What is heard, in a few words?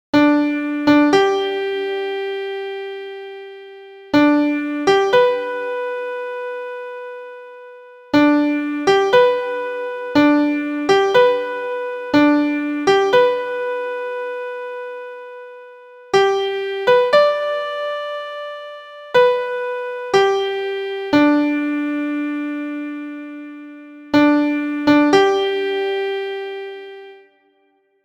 Taps